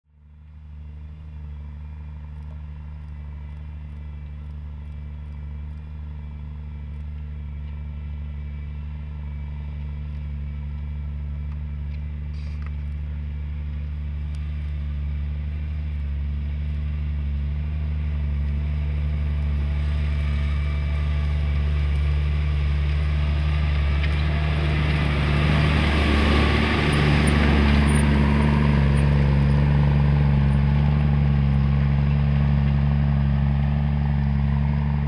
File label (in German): Xerion 3800, Claas Xerion 3800, Caterpillar-Sechszylinder, Turbo; Ladeluftkühler; Leistung: 379 PS/ 279 kW; Kotte